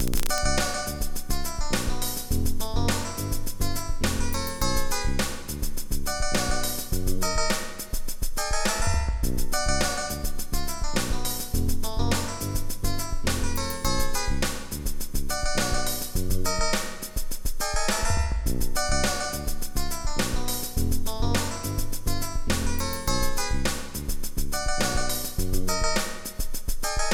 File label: funky 37edo origin b246hz